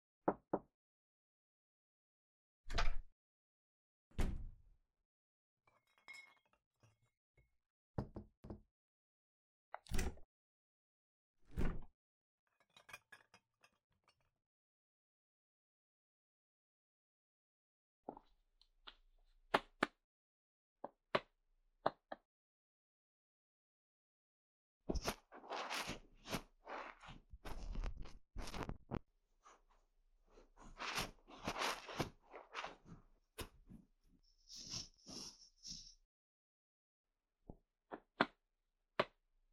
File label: ear massage, sound only; Dewi Ratih Men's-秘密のメンズエステサロン